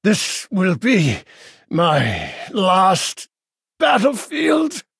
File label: last gasp; house kinsman